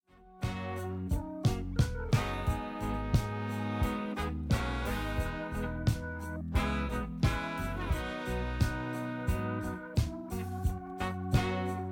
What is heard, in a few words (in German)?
Lern-Sopran und Solo